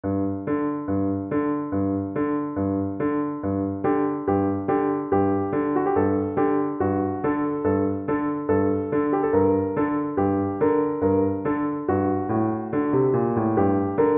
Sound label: danses des cygnets, oboe